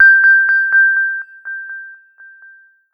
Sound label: findme alarm; AOSNotification